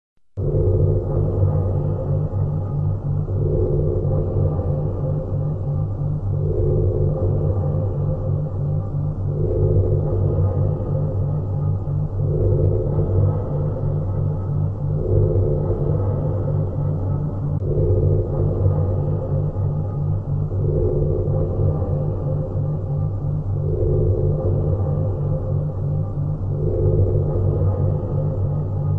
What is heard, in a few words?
Tardis console